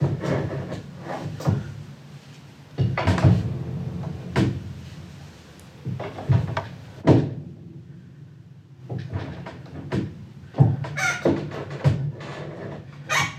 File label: Upright Home Piano Pedal Noises; Multisamples; Ableton Live 12 Lite; Core Library